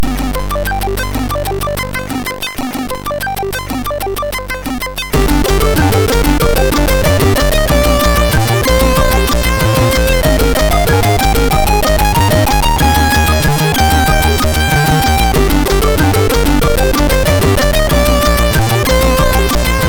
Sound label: Zoomer, sid MOS6581R3